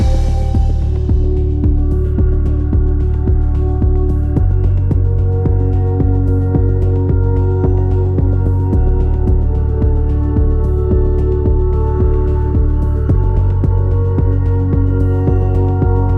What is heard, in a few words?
Exploration Day Remix Loop